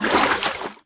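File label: water out; player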